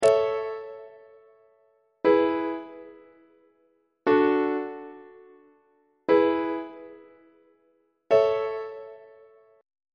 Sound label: a major plagal cadence; on treble clef